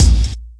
verby kick a sharp key, kick drum single shot tuned to the A# note. Loudest frequency: 1007Hz; kick drum samples